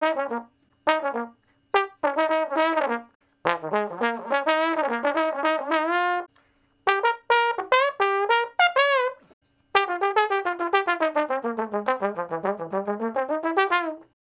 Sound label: Trombone Slo Blus (Bb I, IV, I, I, IV), Solos, Horncraft for R&B; Crimson Blue and Fabulous Horncraft 4 RnB